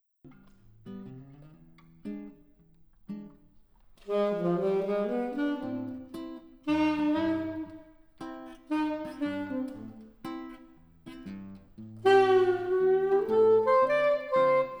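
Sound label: Pure Binaural Recording Guitar And Saxophone Duet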